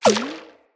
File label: Ricochet Mushroom Hit; Puzzles; BeanStalk